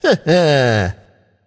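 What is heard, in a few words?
"heh heh", nonverbal; smite; voicepack; khepri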